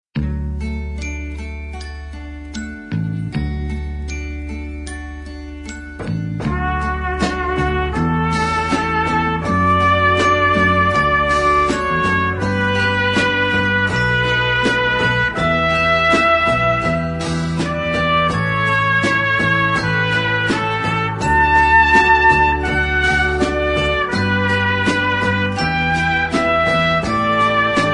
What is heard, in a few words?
Reverie Pour Une Trompette; Instrumentais